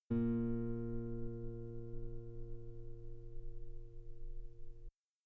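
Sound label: guitar A sharp 3 very long piano normal; bananenkeyboard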